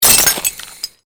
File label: Created Magic Spell Ice Bolt Impact